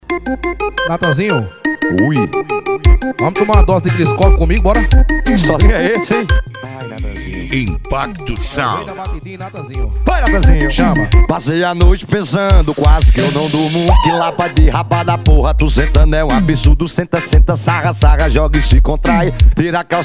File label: sertanejo; Impacto Sound Esp. Sertanejo